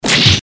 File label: eastern fist hit seq